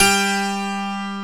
HARPSICHORD

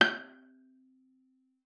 G6, VIOLA PIZZ; Xsample VOL-5

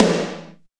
TOM, Drums; Best Service; Real Mega Drums VOL-1

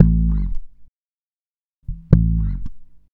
BASSE, Roots Disco; USB Soundscan vol.32; AKAI

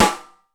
ROOM S, ROOM KITS; Crypton; DrumBase5000

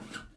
SLIDE, Blues Guitar; ILIO; Fretworks; Blues Guitar Samples; SIMMS RIFF

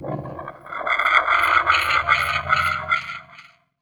weird; Best Service - XXL Production Effects

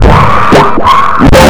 LOOP DISTO 1; Big Beat Samples & More; AKAI; ALL LOOP KIT

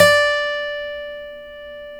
folk steel, guitars

